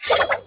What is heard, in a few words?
Rage Blow